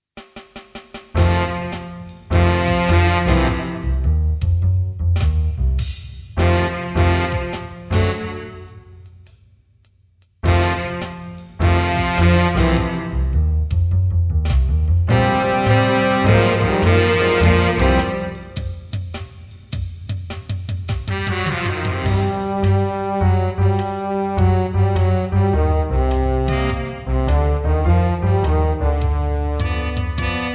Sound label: big deal minus gtr